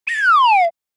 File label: Cartoon slide whistle down